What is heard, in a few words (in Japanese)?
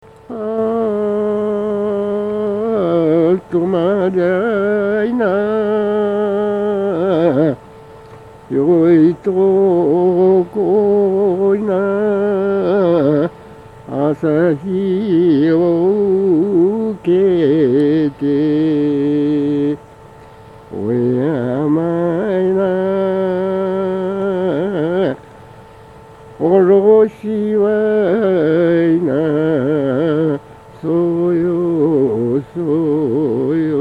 tomaribo no odoriuta